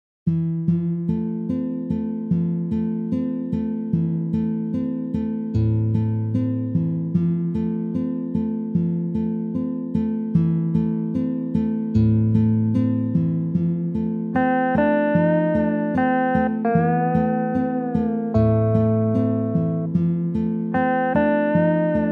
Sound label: nocturne midi